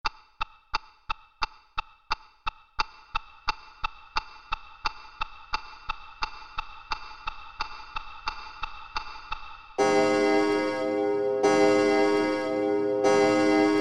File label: Alarm Clock